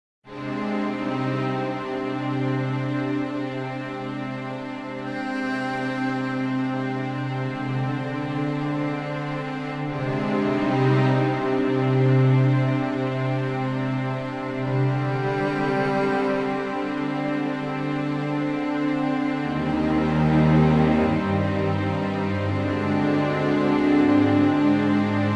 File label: Dark Calm